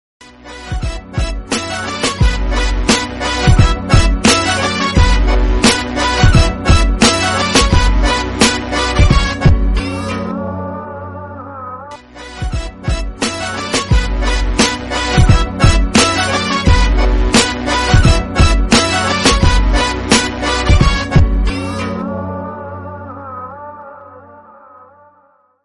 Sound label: love Bgm; Pagal movie